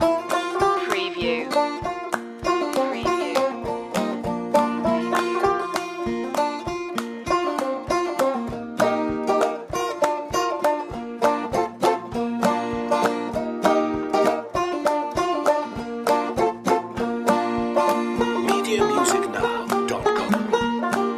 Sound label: barnwood banjo loop